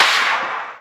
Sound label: Metal Hit; Synthetic's Serum Collection; Ronny J Noises